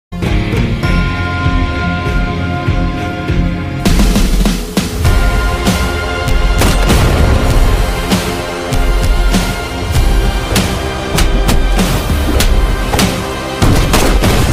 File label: stylish bgm; captain miller